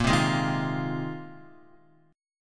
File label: Bb 7th Suspended 2nd Suspended 4th Bb, Guitar 4F 4A 5C 5G 5C 6E; Bb7sus2sus4; strummed; x,1,0,1,3,1; JGuitar